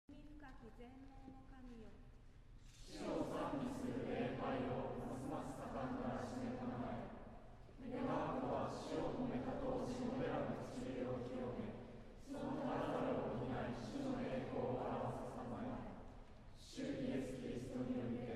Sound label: choir